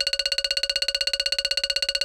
tik i tik i tik

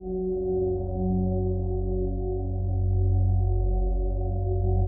tenue profonde

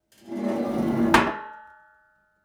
chaise frottee

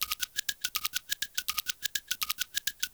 gouttelettes

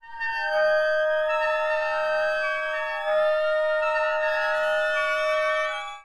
plainte

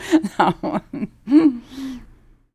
rire femme

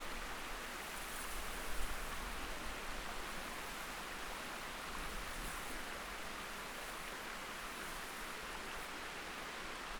vent doux

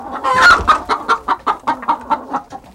poule

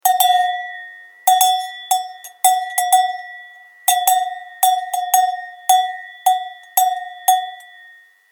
cloche de vache

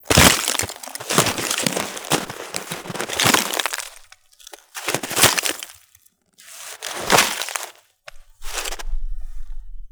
glace brisee

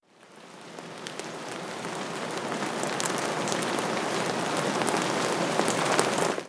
pluie; zone d exclusion 2013

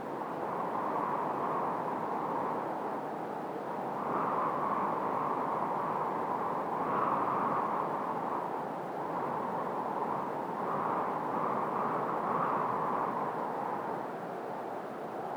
vent sifflant

